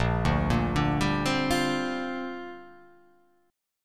A sharp 6th Add 9th

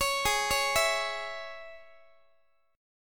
Gsharp Suspended 4th Sharp 5th, Guitar; arpeggiated

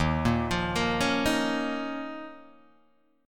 Eb 7th Suspended 2nd, Guitar, Open D

